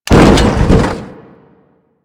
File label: Fall C; Warframe; Grineer; Foley